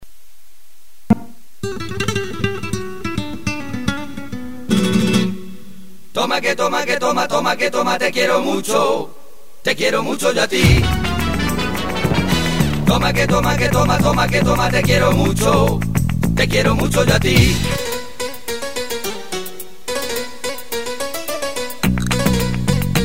Instrumental

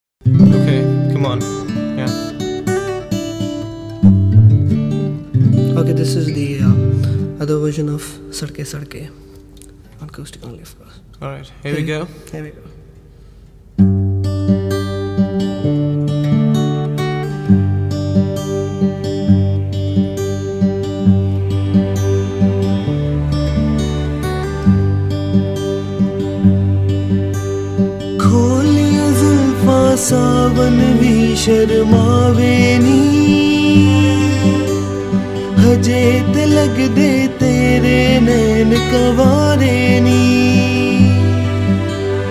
Slow

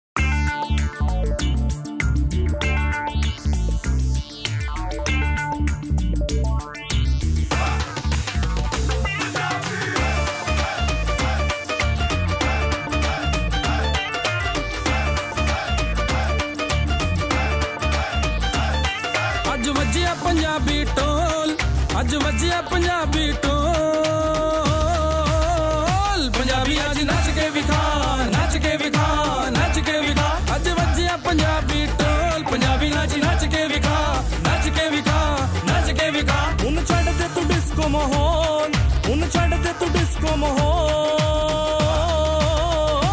Punjabi Dhol

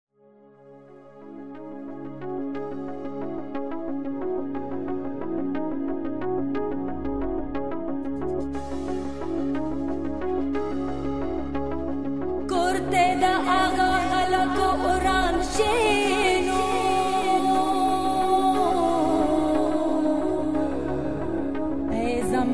Tarana (Pushto)

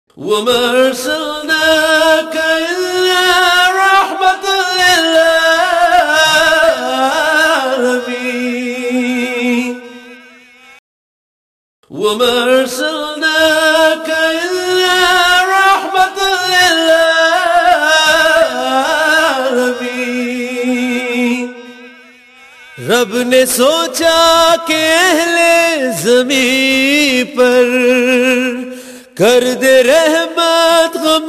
Naat

